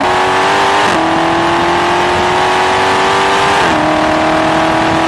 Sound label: third, vehicles